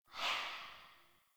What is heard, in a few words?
Soul Steal Latent In